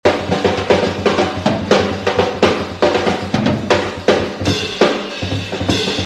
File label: drumsolo